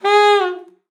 sax g#4, Saxophone slides

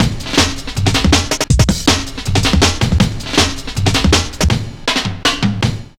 160, Drum Loops; Total Drum&Bass

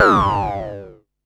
Perc Synth Raw

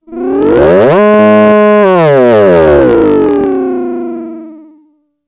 FX, Drum Machines; Fricke Schlagzwerg

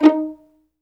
STR 2 PIZZ, String Sections, Violins Pizz; Roland LCDP13

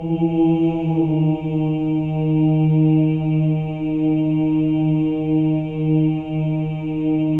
Voices

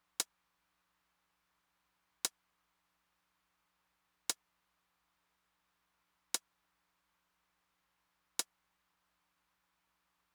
closed hat, Roland TR-909; Roland TR-909 Ableton Project

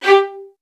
XST1 G4, LOUD VIOLINS; Q Up Arts; Denny Jaeger Master Violin Library

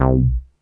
NASTY BASS; Masterbits - Vintage Voltage; VV BASSES

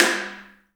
HAIRCUTS, KENYA DRM, Heart of Africa

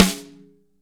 SNARES DRY; Real Mega Drums

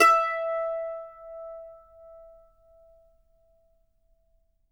mando notes E5; Sampleheads; Whole Lotta Country 1 & 2

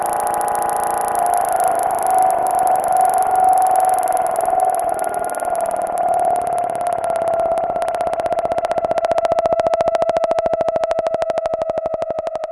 FX, EMS VCS3

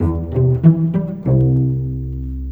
Cello, Orchestral, Rock Pop; Sonic Foundry (Sony Creative Software); Orchestral Series VOL-4; Rock and Pop; Rock-Pop 04

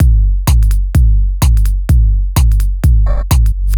Tool A 127bpm, House Drumloops; 90 s Sample CDs; Zero-G House Fabrik Drumloops; ZG Drumloops

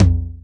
Yamaha CS6, Drums

